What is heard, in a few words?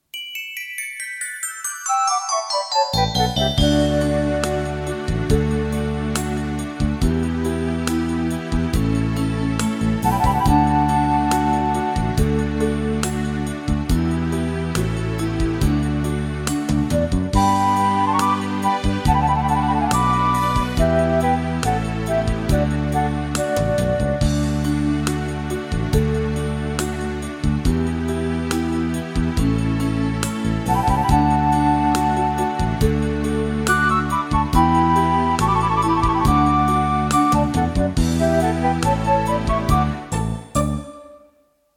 karaoke only